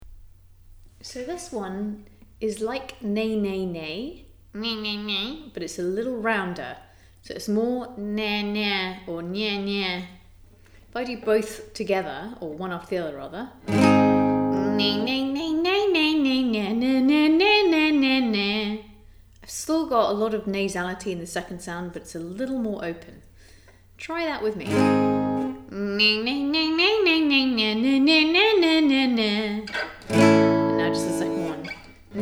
Backing tracks; FYV style session 2; find your voice style; Style part 2

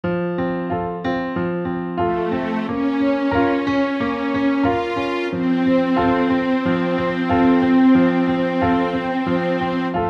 Improvisation in F

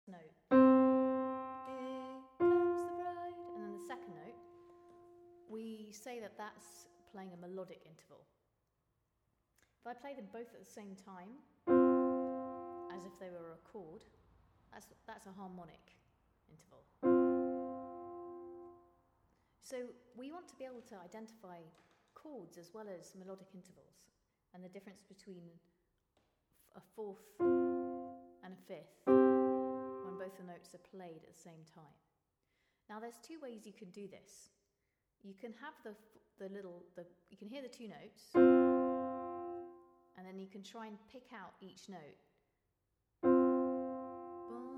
Fourths ear training harmonic intervals

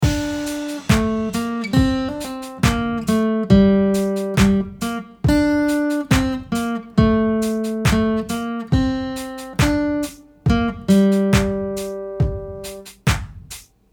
balkanarama 69 bpm melody and drums only

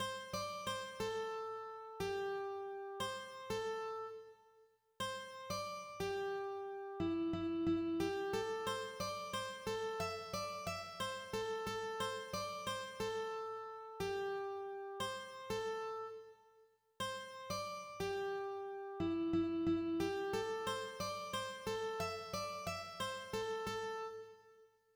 Eighth note triplets phrases only 44 mixed 60

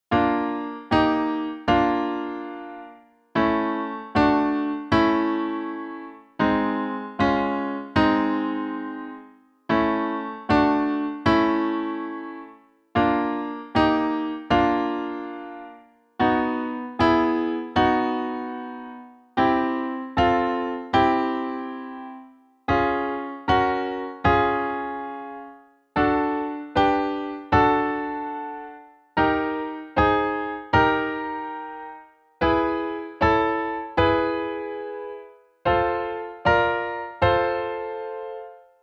Piano slide backing

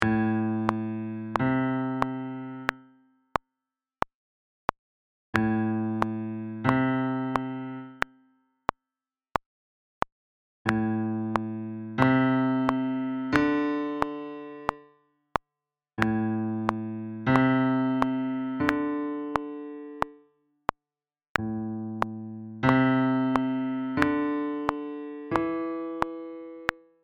Ear training minor pentatonic

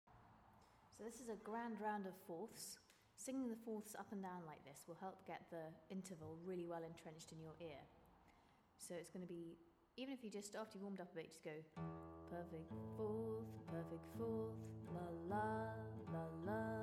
Fourths ear training; grand round

